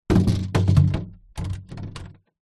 foley water cannister plastic 10 ltr down on concrete ground; zapsplat